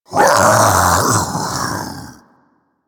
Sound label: Infested Splitter Vocal Alerted; Warframe; Grey Strain